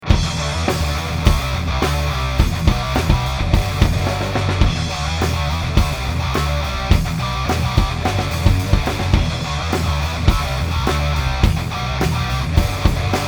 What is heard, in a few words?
metal raw, gtar